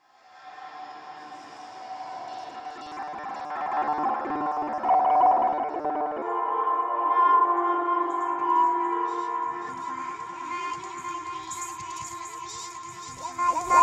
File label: radio campus chorus